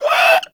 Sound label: Infested Runner Pain; Warframe; Runners